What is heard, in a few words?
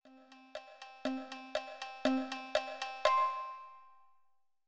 Start Sound